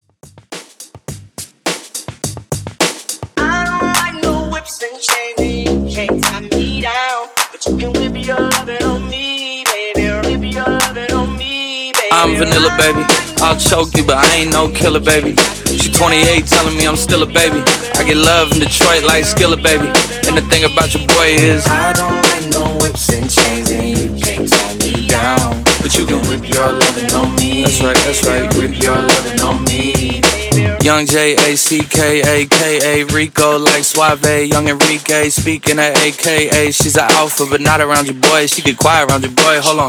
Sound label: Top40 Hits 105 BPM